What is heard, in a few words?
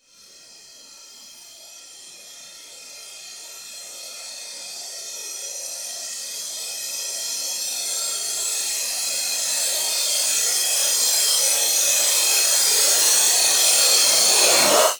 128BPM Long Reverse Cymbal; FX Fills; 8 Bars; Vengeance Samples